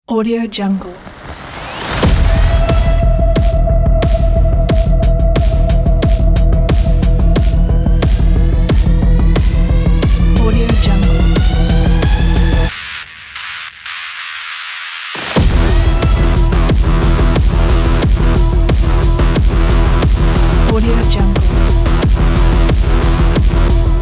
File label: cyberpunk trailer ident